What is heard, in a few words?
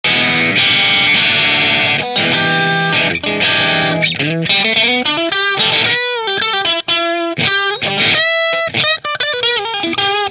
Robin tonemonger position 4 marshall, guitars